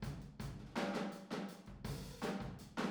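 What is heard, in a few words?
Boogaloo, Room Stereo, 82 BPM; Drums; Verse; Sonic Foundry; Drums from the Big Room The Mixes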